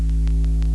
electric

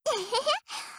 jump; m4mod

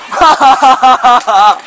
hahaha

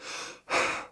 sprint breathe, police

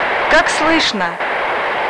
radio

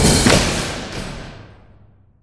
pipeline fast door

